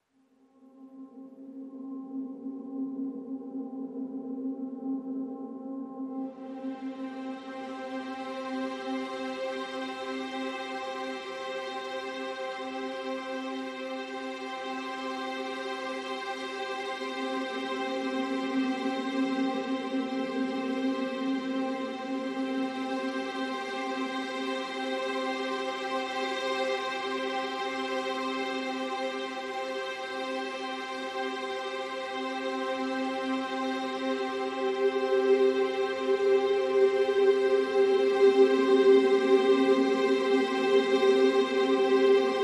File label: PADS Ghostly Whispers (C)